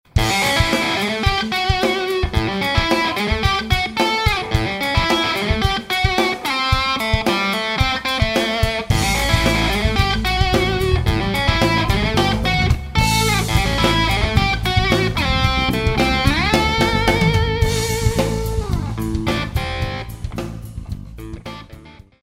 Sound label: blues